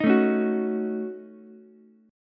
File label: Gb Minor 6th, Ukulele, Gbm6 chord; strummed; JGuitar